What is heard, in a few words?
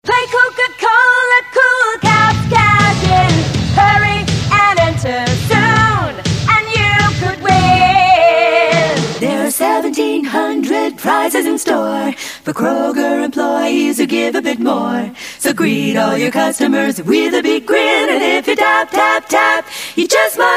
jingle